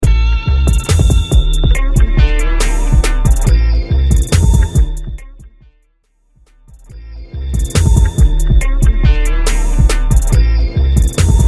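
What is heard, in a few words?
Hype Intro Extended Mix; Mainstream VIP; Vegas Edit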